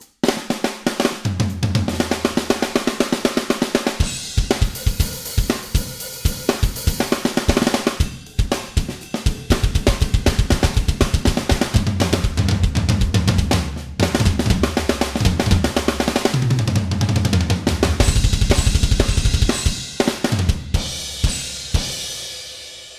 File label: basic kit; EZD tweak